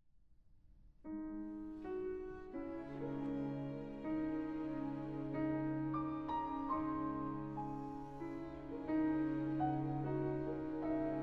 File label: Piano Concerto